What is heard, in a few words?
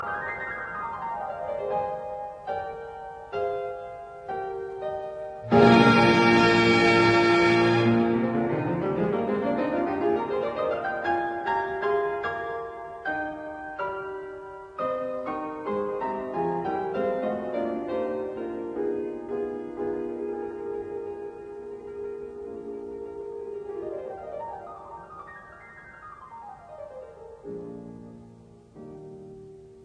Piano Concerto in E flat major Emperor Allegro; No 5; Op 73; Walter Gieseking